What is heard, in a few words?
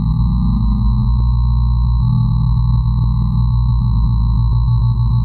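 Ambience Sci-fi Energy Loop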